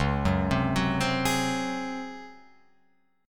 Db Major 7th Suspended 4th Db, Guitar Open F, chord; arpeggiated; JGuitar